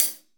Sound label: HiHat Closed